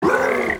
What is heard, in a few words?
cry; grimer